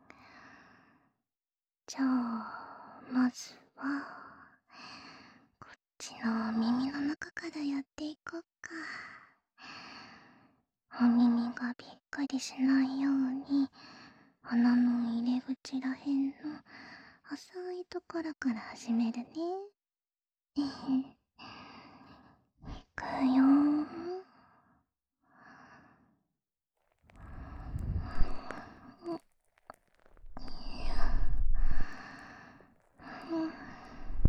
耳朵性爱的前戏…按摩''触感''掏耳♪, ASMR; 真正深入耳道的''印章''舔舐～用舌尖使劲伸进耳朵深处≪深层压迫ASMR≫～

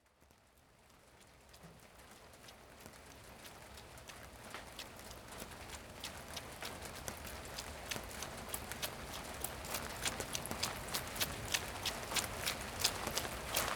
植物園的雨聲+精油綿花棒＆用掏耳棒掏耳朵; 在植物園的休息室接受白銀的掏右耳; 蓄音レヱル しろがね

